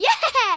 cheer Builder

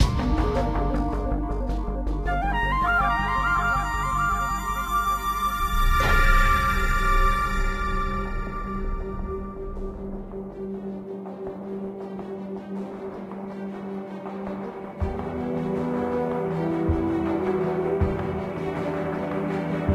Mining Complex; James Cameron's Avatar The Game

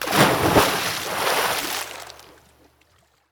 water splash; teardown; ps5 windows xbox series xs

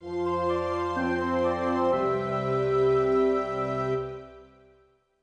Event Heart Warm; The Nightmare of Druaga Fushigi no Dungeon; PS2

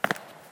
Footstep; Five Nights at Ronald's

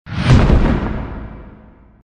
Sfx Baphomet Jump; Mighty Knight 2

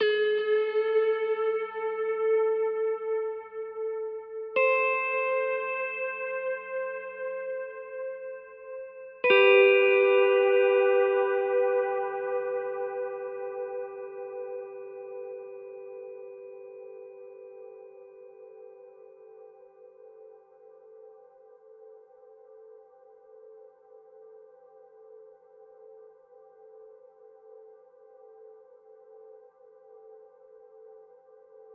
guitar; Void Bastards; Krell

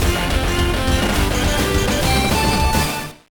Jingle; Get Ride AMDriver Shuggeki Battle Party; Game Boy Advance

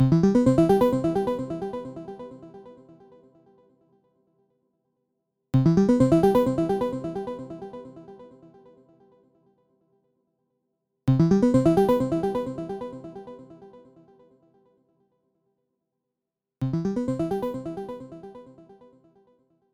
New Chapter Arp

